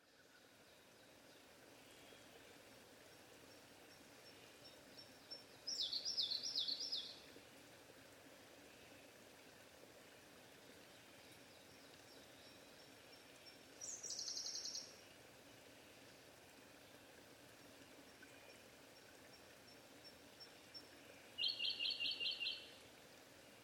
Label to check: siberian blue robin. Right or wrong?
Right